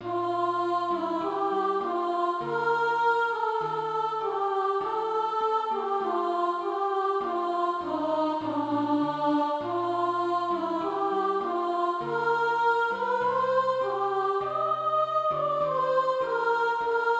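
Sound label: soprano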